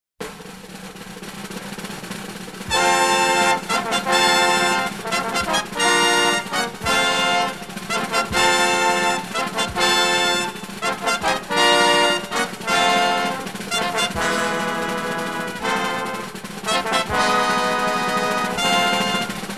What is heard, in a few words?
Fanfare